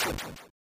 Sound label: Item Chime; Passage Open